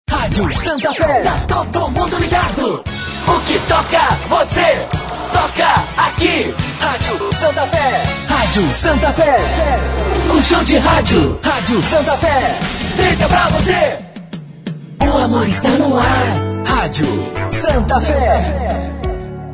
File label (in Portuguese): vinhetas popular